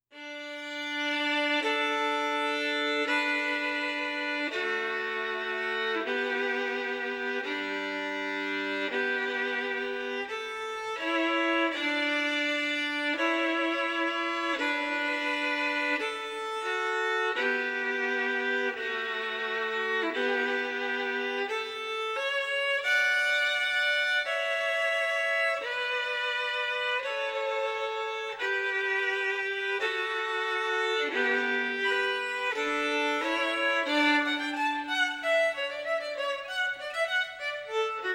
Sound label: Solo Violin; Pachelbel Canon